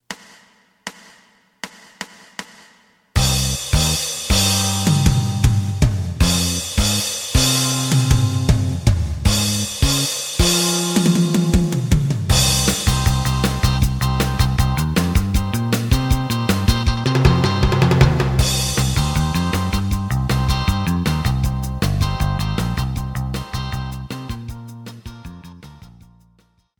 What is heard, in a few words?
lead; エレキバンド用カラオケCD